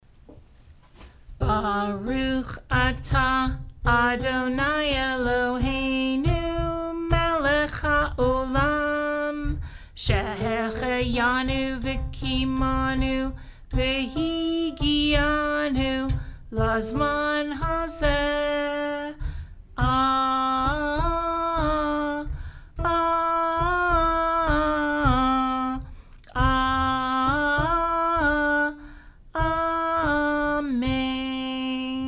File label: Shehecheyanu together normal; Hebrew